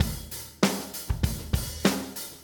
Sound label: 098 Steady Drums; Pop Rock; Moderate Tempo; Pro Tools Essential Loops; Digidesign